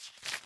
Money Extent; virtual city windows gamerip 2009

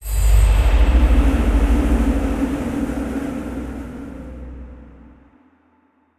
environment temple room wind

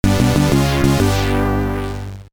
Scene Complete (Techno Party); apidya; amiga

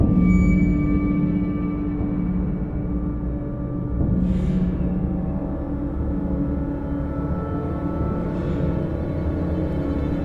loop Tense

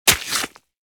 Warrior Impale

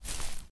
Footstep grass; this is the zodiac speaking 2020 gamerip switch ps4 xbox one pc macos

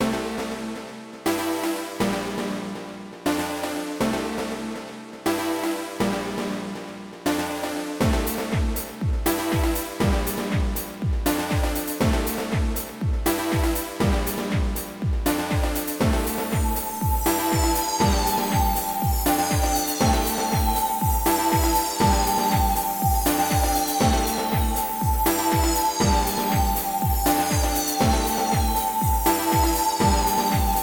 upbeat; Naev

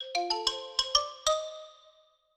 licks

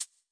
tile

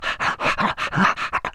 Dog Idle

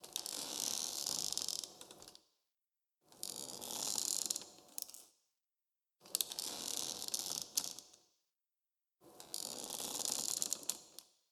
Ambience basket; adams venture origins

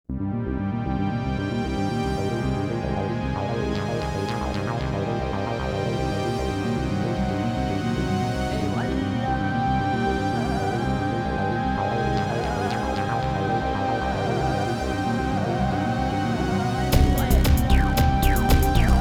Trance Mind; Leaf Early Collection

